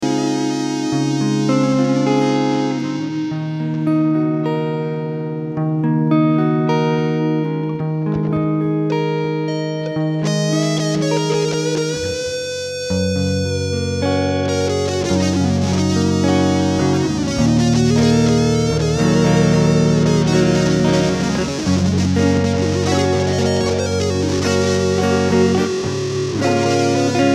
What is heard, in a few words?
Solo